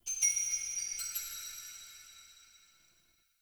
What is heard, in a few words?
Cave Mysterious Distant, Ambience; Midrath Island; Shrine Cave